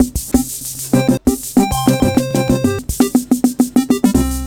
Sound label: Elgam Carousel